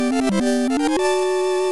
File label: tetris success